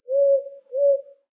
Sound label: Haunted